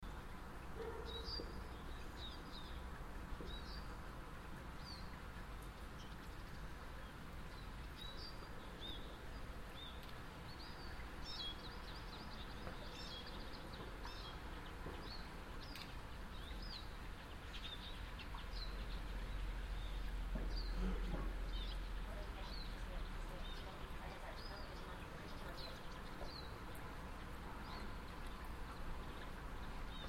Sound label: sounds of outside